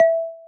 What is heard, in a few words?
fade